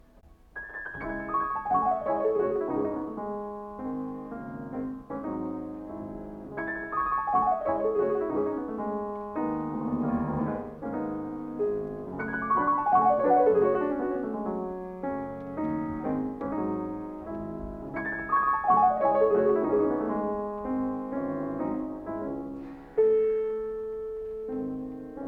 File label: Prelude op. 28 No. 10 in C-sharp minor; Richter; Chopin; Live Moscow, June 1950